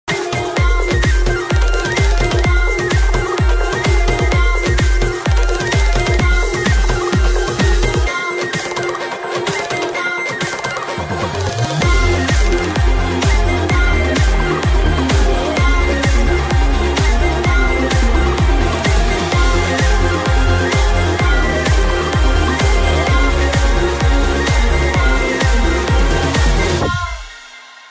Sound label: heart break bgm ringtone; premalu